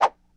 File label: Arm Whoosh Quick, Hand To Hand Combat; Sony Pictures Sound Effects Series